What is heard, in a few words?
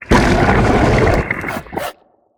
dead, Spineling giant